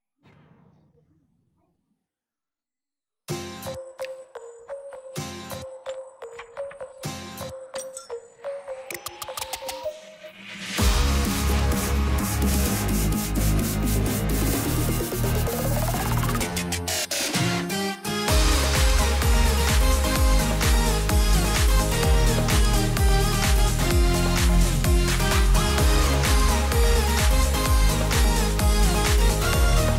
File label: off vocal